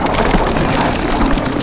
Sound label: Plant Giant Thorn Vine Grow Loop; SFX Kit; Foley - Misc